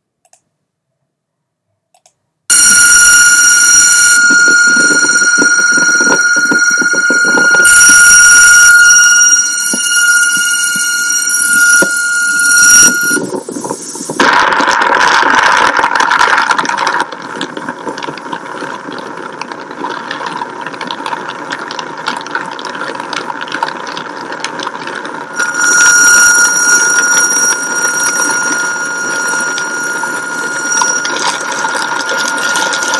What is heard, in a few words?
Screaming Window AC; aircond